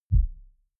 sub bass soft

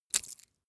bone break small bright

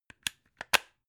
plug plug in clatter

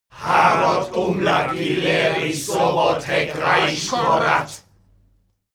male crowd evoke

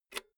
insert jack cable quick

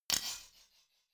drumstick hit clank soft

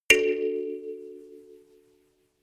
notification kalimba rattle triad major

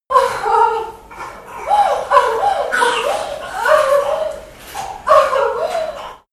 birth newborn

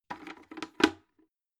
bucket handle use

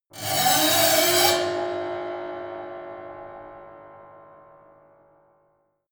piano bow string broken

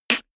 beatbox snare harsh

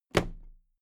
toolbox close drop lid soft

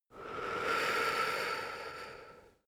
male breath slow out

